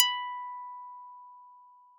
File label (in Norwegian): Streng